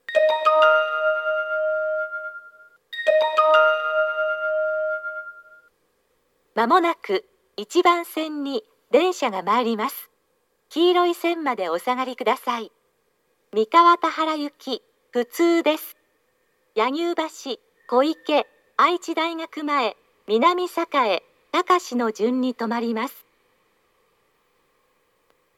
shin-toyohashi local mikawa-tahara, toyotetsu station